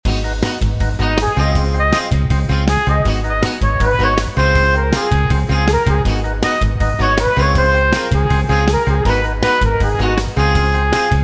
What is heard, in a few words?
Sport Loop